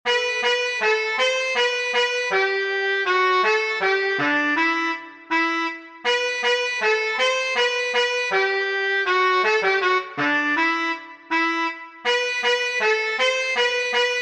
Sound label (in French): focus Chalumeau; Les Valkyries